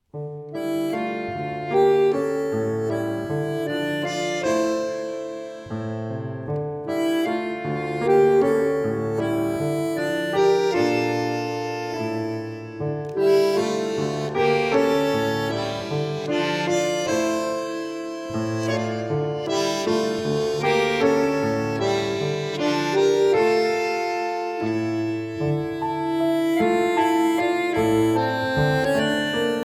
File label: Milonga